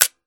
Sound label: Mp5 DryFire; zps; Weapons; Firearms